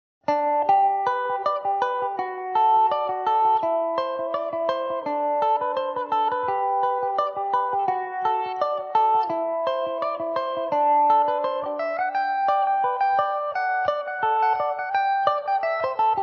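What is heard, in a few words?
G major mandobird, irish mandolin; The Parakeet; Epiphone Mandobird VIII electric mandolin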